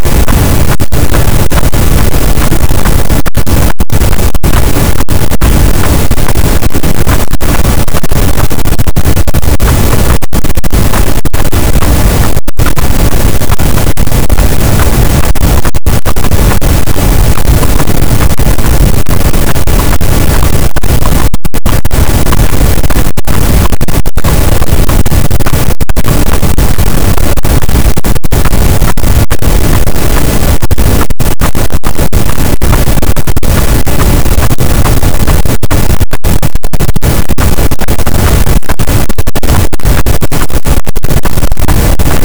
cachalot, Physeter Macrocephalus, gaussian noise

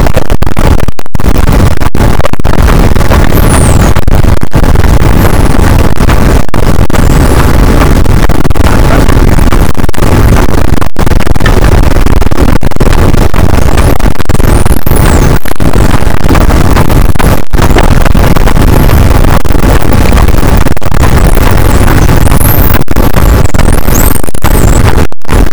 cachalot negatif, boat, Physeter Macrocephalus

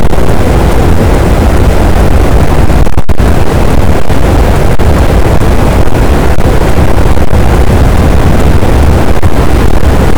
cachalot, boat; Physeter Macrocephalus

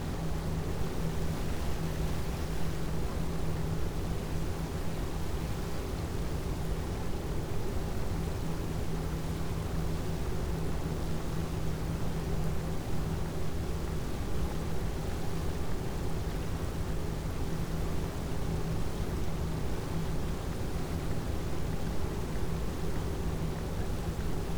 cachalot negatif, Physeter Macrocephalus

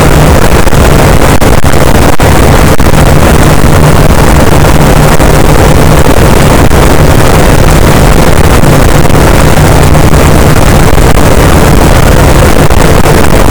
cachalot positif, boat; Physeter Macrocephalus

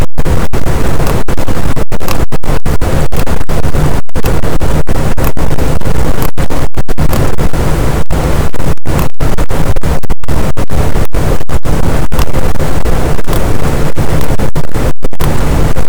cachalot positif, boat, Physeter Macrocephalus

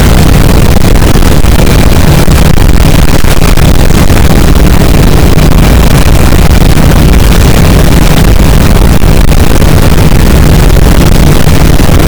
cachalot positif, boat; Physeter Macrocephalus

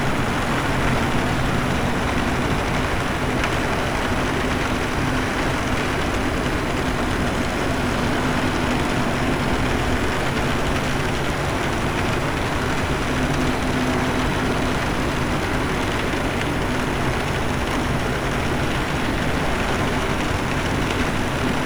cachalot negatif, Physeter Macrocephalus

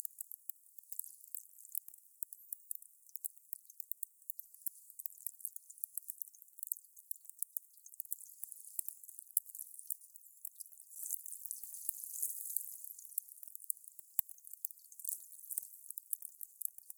meute 4 Cachalots 3km distance enregistres 2m surface 12mn Sud Monaco; Sphyrna Odyssey; FranceInter; TerreauCarre

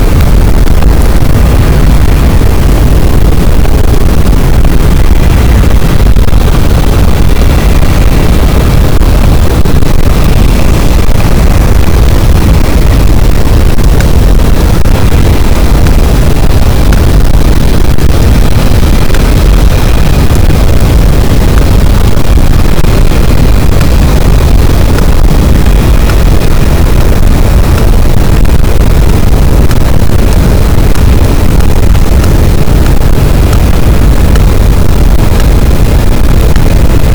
cachalot positif, boat, Physeter Macrocephalus

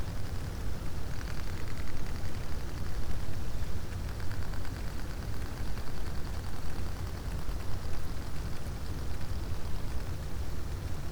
cachalot positif, Physeter Macrocephalus

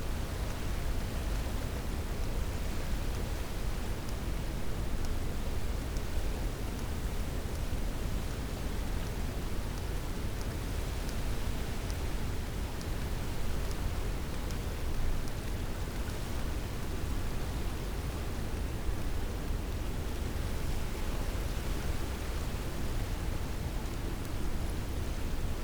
cachalot positif, Physeter Macrocephalus

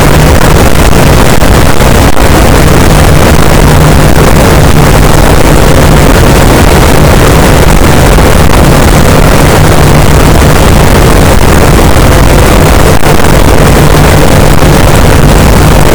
cachalot negatif, boat, Physeter Macrocephalus

